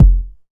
kick drum single hit F key, Royality free bass drum sample tuned to the F note